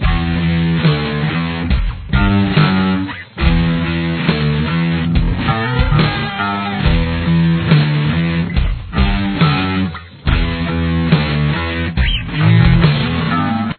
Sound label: slow, guitar